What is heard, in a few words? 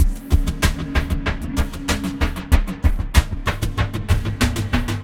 Terrestrial Hybrid, Processed Percussion; Sonic Foundry (Sony Creative Software); Rhythmicronics